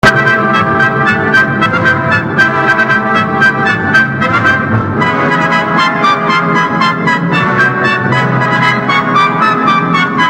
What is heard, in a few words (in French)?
sons pendule attente; PROG ORTF 1972